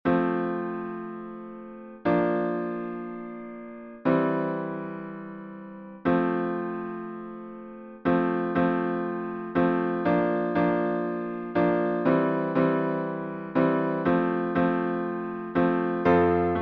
Chord Structures And Rhythms F; Chords Packet 3

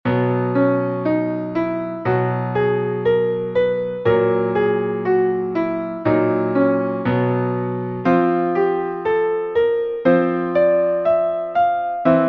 Major Scales Black Key Madness; Major Scales 2: Black-Key Madness

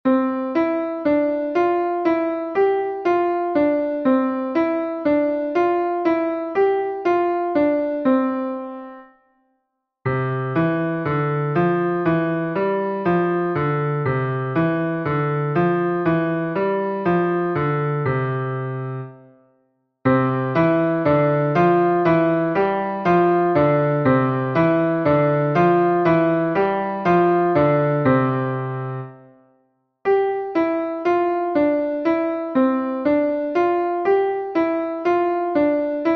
Climbing and falling Thirds

